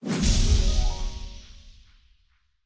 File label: freegames end; Great Book Of Magic; Wazdan